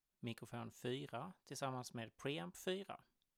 mic pre

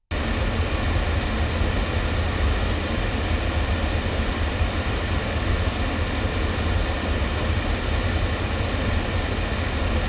Rode NT2 omni loudspeakers

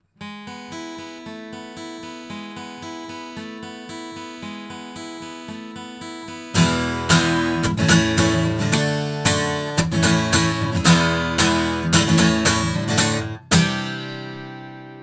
sE Gemini guitar, microphones; se electronics

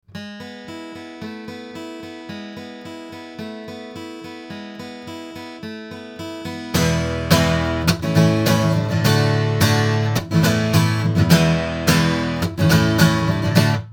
guitar; Proscenium; crowley tripp

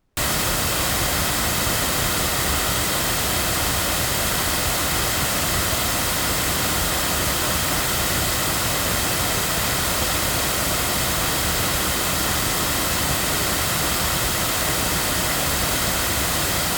loudspeakers; Lauten Oceanus; microphones

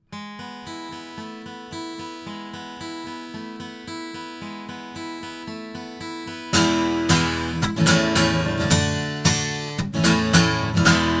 Golden Age TC1 cardioid guitar

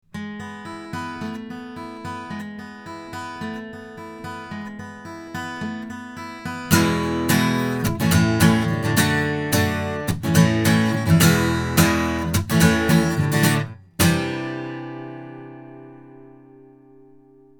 MXL Genesis guitar

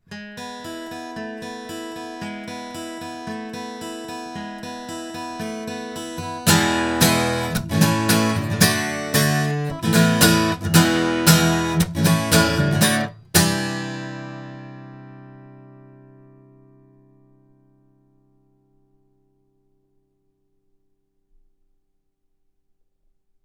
MXL V69 guitar

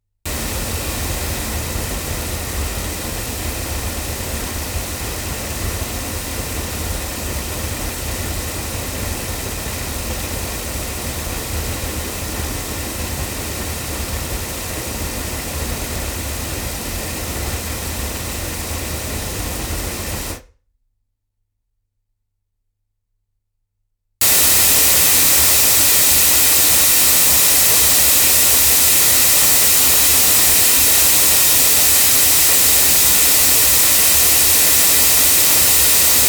ADK A51TL cardioid loudspeakers

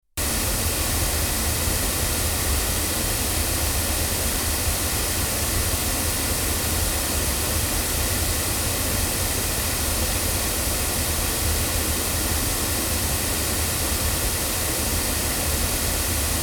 MT47 cardioid loudspeakers; TSM

